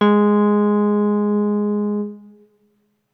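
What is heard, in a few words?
deep G#2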